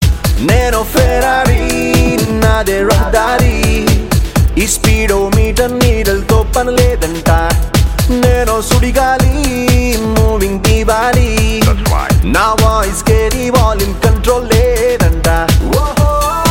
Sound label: Chill Out